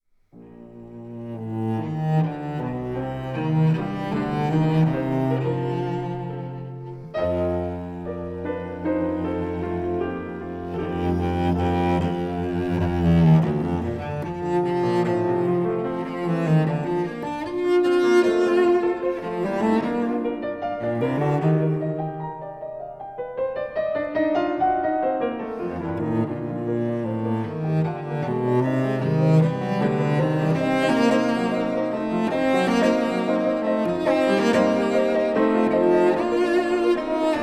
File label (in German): Sonate für Violoncello und Klavier, Allegro vivace; Felix Mendelssohn; B Dur; op.45